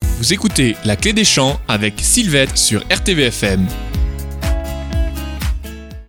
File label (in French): jingle; cle des chants